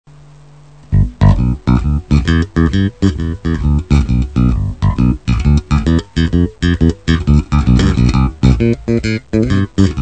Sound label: Bassgroove